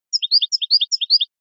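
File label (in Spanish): floreo chibaba chibaba, canario; campero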